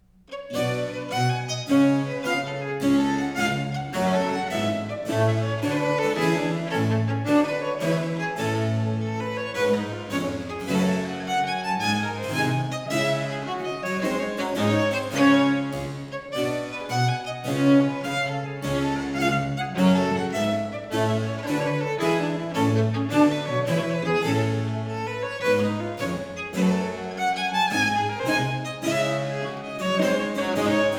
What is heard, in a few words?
Ayres for the Violin, Suite in G Minor, Giga; Book 2; Italian Baroque Violin Sonatas; Nicola Matteis; Ayres for the Violin, Vol.1; 尼古拉斯 · 麦克吉根